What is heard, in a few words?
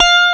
F4 TELECASTR, Bass Guitar; AKAI S6000 CD-ROM - Volume 1